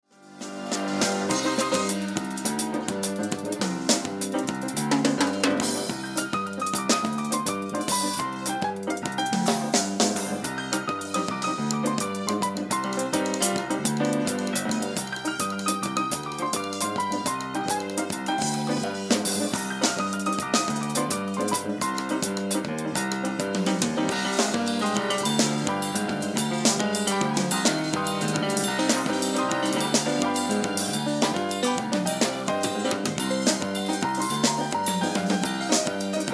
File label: island beat; Contemporary Eclectic Sounds